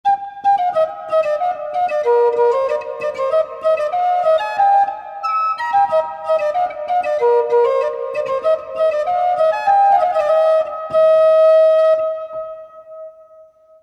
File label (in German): portativ